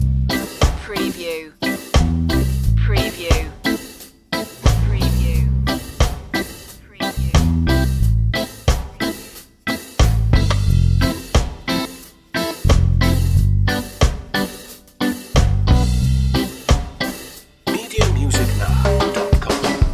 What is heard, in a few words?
rock steady loop